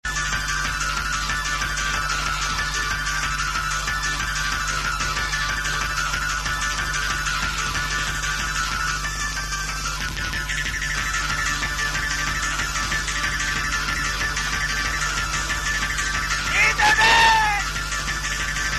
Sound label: piano tune; electric